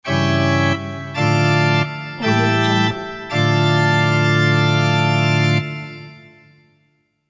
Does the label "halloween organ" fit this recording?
Yes